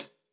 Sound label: KHats Clsd